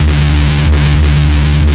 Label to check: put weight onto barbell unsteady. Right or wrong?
Wrong